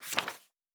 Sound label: page